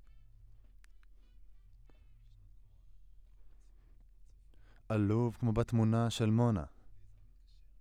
Voc OD

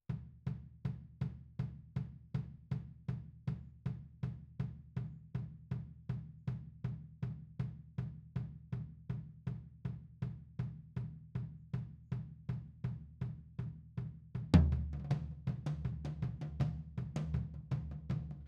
Tom, 160 BPM

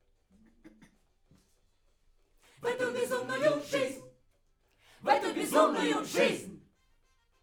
Backs; SCHOEPS

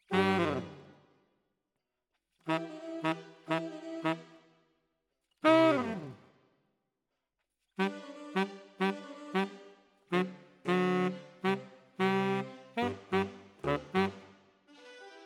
Tenor, RNR for piano

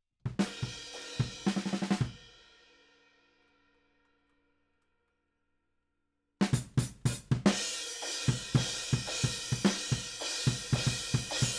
Ride

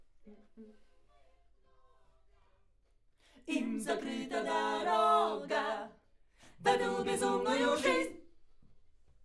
Backs AKG LEFT

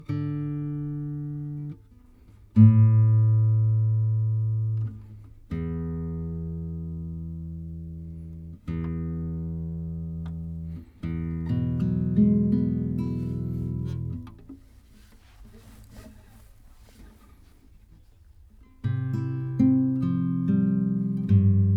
Tape GTR

